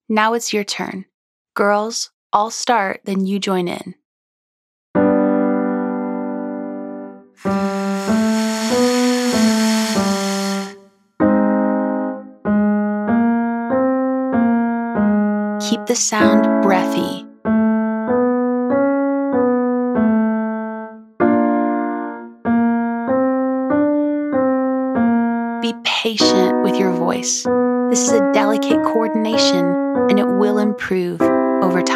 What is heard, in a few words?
Breathy VVV 3 Note Scale Female Version